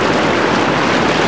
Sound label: WATER; Honda2000